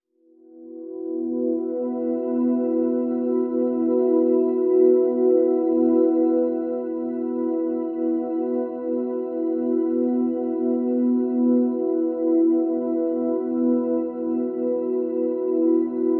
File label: Pads (E)